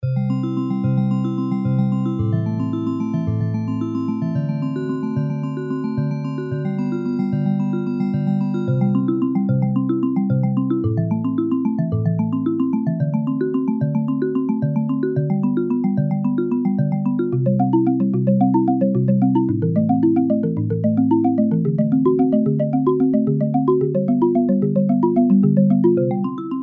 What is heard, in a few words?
chromaphone arp; aas